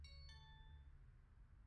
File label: alert; IEEE AASP